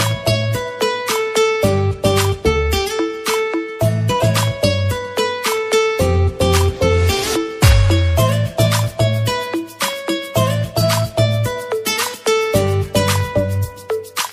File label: sweet music ringtone, bollywood ringtones